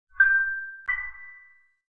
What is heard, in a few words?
train signal